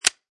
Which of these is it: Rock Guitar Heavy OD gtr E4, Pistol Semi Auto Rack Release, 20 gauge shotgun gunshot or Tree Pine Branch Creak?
Pistol Semi Auto Rack Release